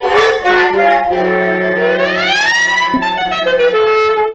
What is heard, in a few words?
Old Timey Fail